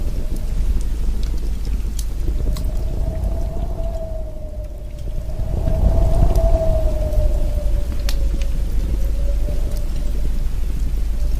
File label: light rain with wind